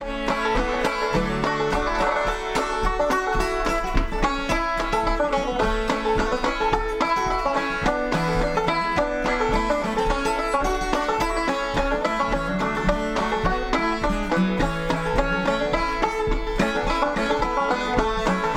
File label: musicbox